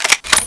bizon cock, firearms weapons

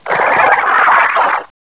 headcrab alert; gearbox

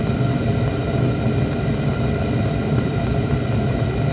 gas

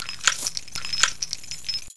ammo belt, m60; weapons

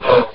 Pred Jump; svencoop; avp2pred

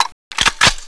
Reload; svencoop

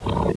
wolt run grunt; sexr

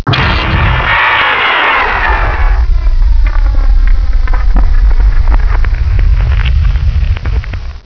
flyball fly; gearbox; flier

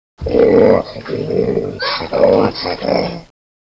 idle chitter; rheptor

